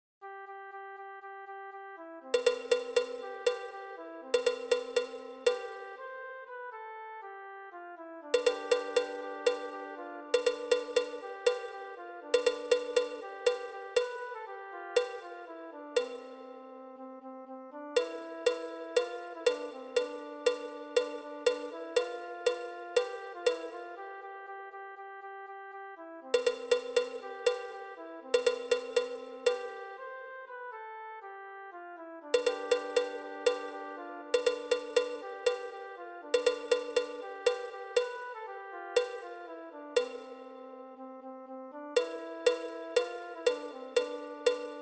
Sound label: PERC; Dançar queremos